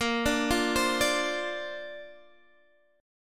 Bb Major Add 9th, Guitar, Half Step Down

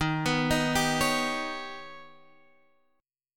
Eb Augmented 7th Eb down Guitar Half Step Down

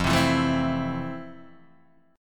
F Major 7th F, Guitar, DADGAD

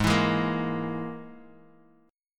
Gsharp Suspended 2nd Sharp 5th, Guitar, Open F; strummed; x,3,4,3,1,x

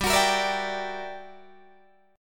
G Minor Major 13th, G, Guitar, DADGAD; GmM13; strummed

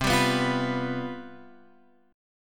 C Major 7th Suspended 4th, down

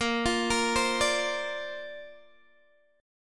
A sharp Major Add 9th, Guitar, DADGAD

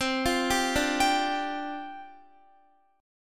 C Suspended 2nd Suspended 4th, down, Guitar; Csus2sus4 arpeggiated

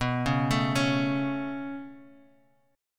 B 7th Suspended 2nd B down; B7sus2; arpeggiated

